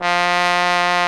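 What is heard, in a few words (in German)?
posaune bass F2, trombone; Best Service; Alpine Volksmusik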